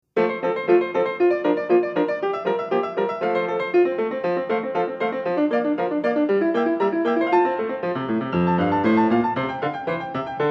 Prelude for keyboard in F major; Bach, Johann Sebastian; BWV 927

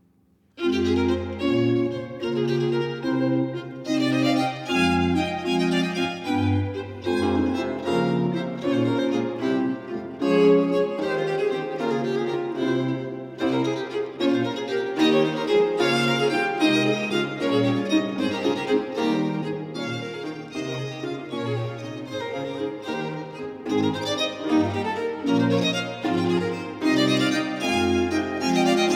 Sonate 10 in G minor - 5. Allegro; Archangelo Corelli; Arcangelo Corelli; Corelli - Complete Works; Klassika

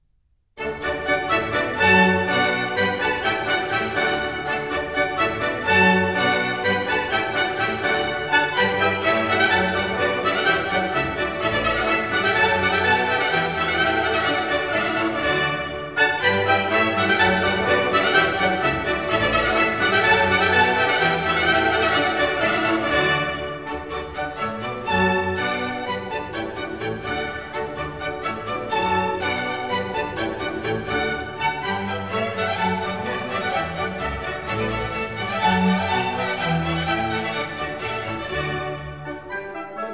Bourrée; Water Music Suite No.2 in D major; Handel Masterworks; Klassika

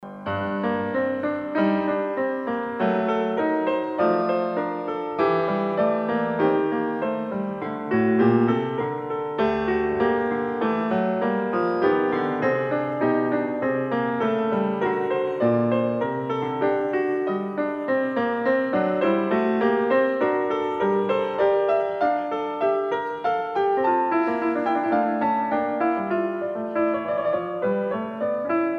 Bach Goldberg Variations

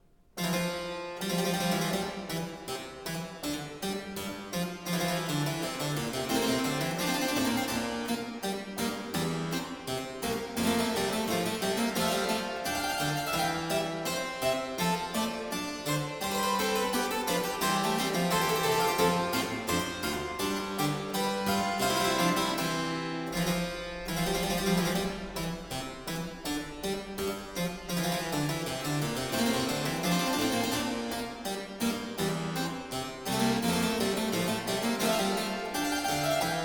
fughetta; Variatio 10; Klassika; Bach, Johann Sebastian; Keyboard Works; Goldberg Variations BWV 988

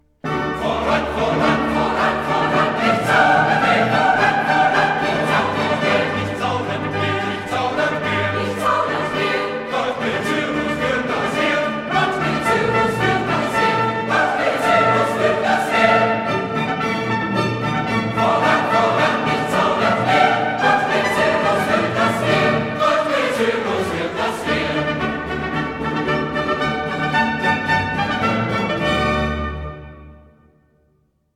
Choir; Voran, Voran; Handel Masterworks; Klassika

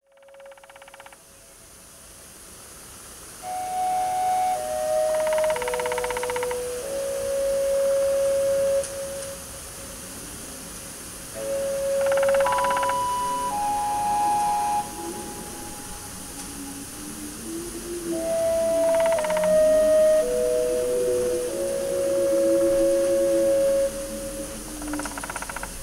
Cleansing Rain, Sounds of Nature

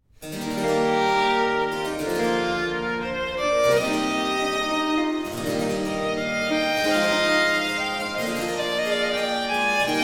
Sonate 7 in F major 1. Preludio, largo; Arcangelo Corelli; Corelli - Complete Works; Klassika

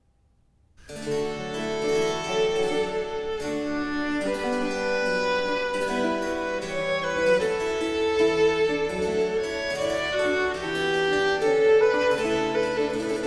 Sonate 11 in E major 1. Preludio, adagio; Arcangelo Corelli; Corelli - Complete Works; Klassika

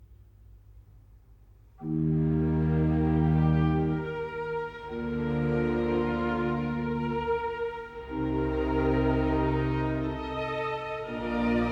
Concerto Grosso in C minor, Adagio; Handel Masterworks; Klassika

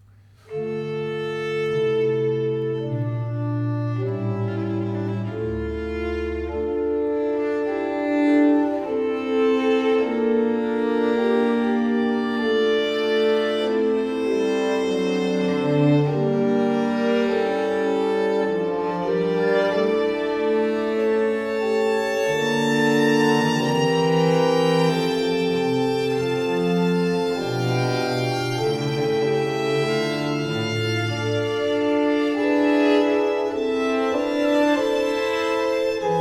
Sonate 6 in G major - 2. Grave; Arcangelo Corelli; Corelli - Complete Works; Klassika

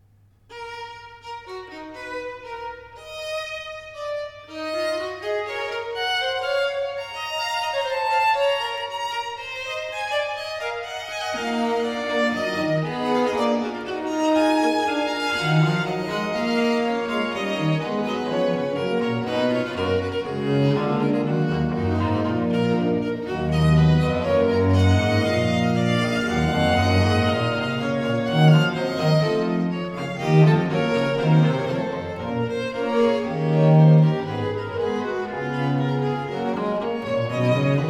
Sonate 9 in F minor - 2. Vivace; Arcangelo Corelli; Corelli - Complete Works; Klassika

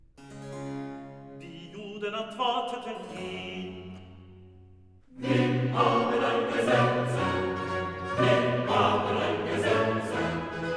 Recitativo, Coro; evangelist Die Juden antworteten ihm; Wir haben ein Gesetze; Klassika; Handel Masterworks

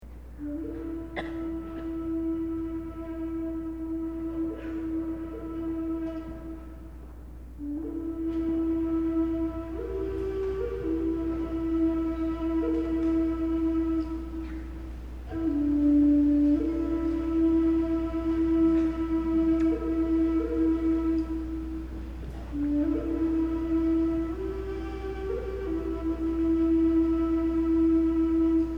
Horn Solo

